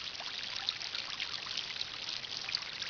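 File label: fountain; World Racing 2; XBOX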